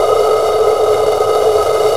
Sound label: G# vox noise; AKAI S-Series CD-ROM Sound Library VOL-5